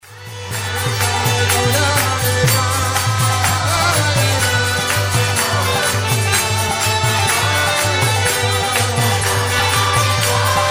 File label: Gaura Hari bol; Niranjan Swami